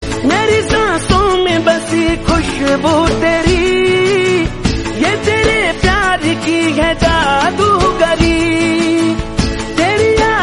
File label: Hindi Dj